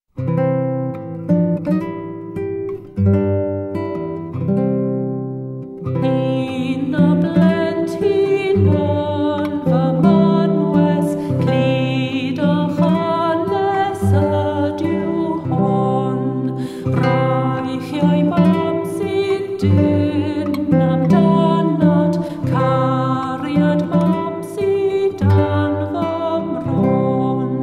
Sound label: SUO GAN IN WELSH